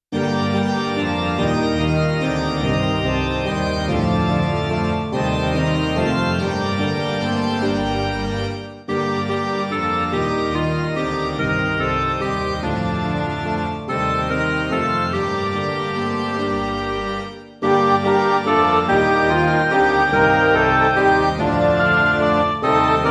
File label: alleluia acclamation, midi